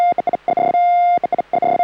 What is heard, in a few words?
signal 145 760